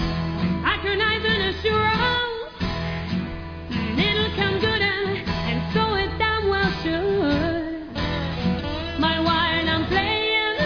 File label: acoustic